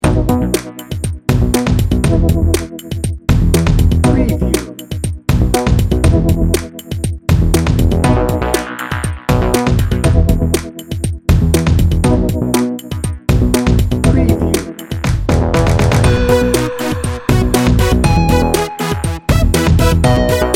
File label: synth pop party bed